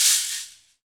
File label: shakers